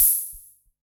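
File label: Analog SR88 Open Hat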